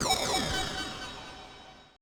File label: AMBI FX